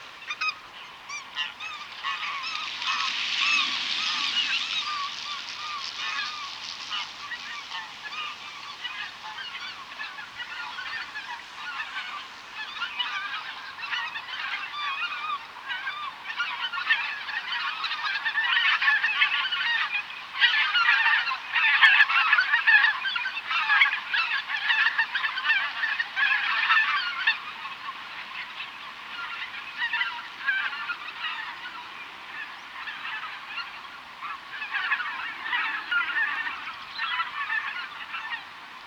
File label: Greater White fronted Goose Anser albifrons albifrons